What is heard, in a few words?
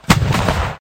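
grenade launcher; guns n zombies 2014 pc; Granatomet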